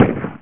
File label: HOOD GUN